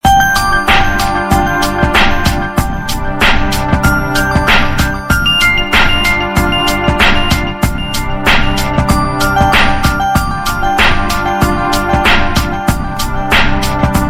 In A Circle, ringtone; Samsung

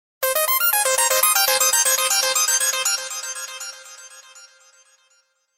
Samsung ringtone Happy synth

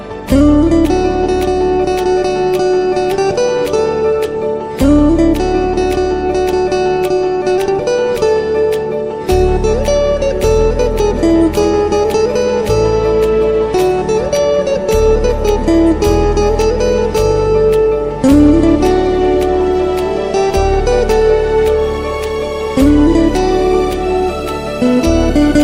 army instrumental ringtone; army call; New Indian Army Ringtone 2020

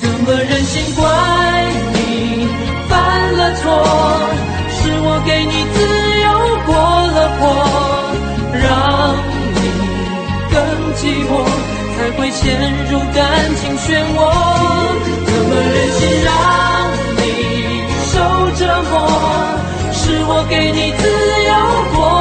Chinese Ringtones

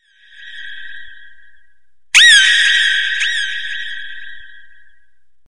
Bat

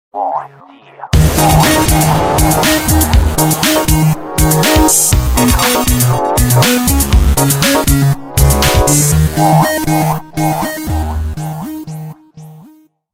Cosmosphere, ringtone; Samsung

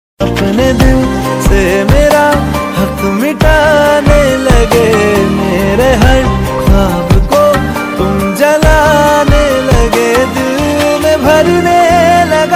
Hindi Ringtone Sad Ringtone Sad Tone

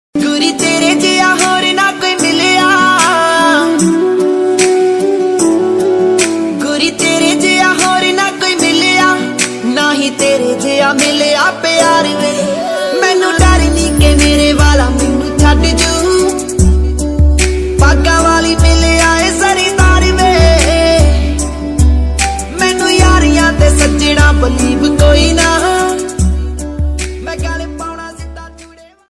Punjabi song ringtone Love ringtone; Mobile Phone Ringtone

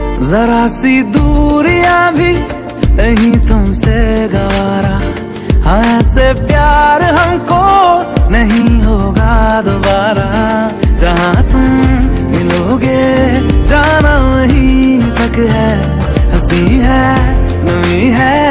Romantic Music Tone, Hindi, Only Music Tone

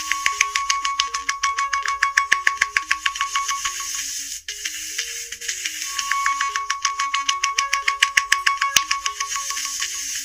Nokia Ringtone

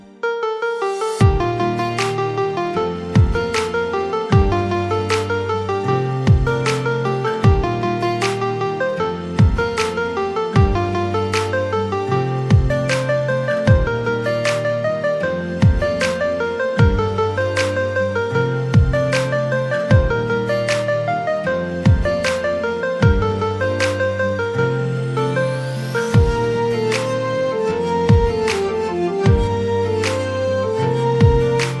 Instrumental Ringtone Sad Ringtone

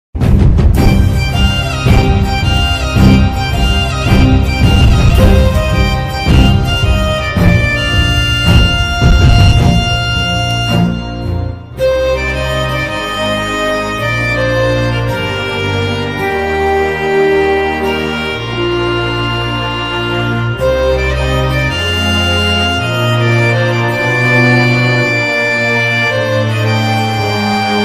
South Ringtone bgm ringtone; bahubali bgm ringtone bahubali ringtone south bgm ringtone

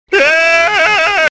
Eeeeeh…

